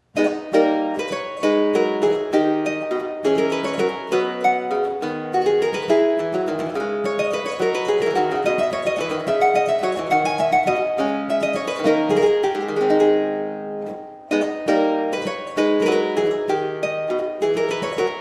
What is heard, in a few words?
corrente, harpsichord; salvatore